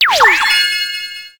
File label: Coin; Puzzle Bobble 2x